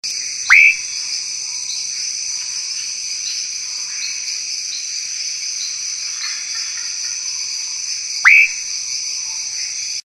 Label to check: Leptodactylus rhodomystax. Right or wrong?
Right